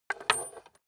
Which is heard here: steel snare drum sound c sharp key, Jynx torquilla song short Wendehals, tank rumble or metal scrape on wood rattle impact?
metal scrape on wood rattle impact